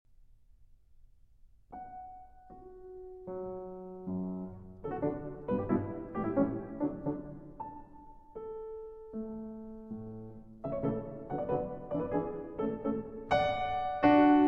Piano Sonata No. 15 in D Major, Op. 28 Pastoral III. Scherzo. Allegro vivace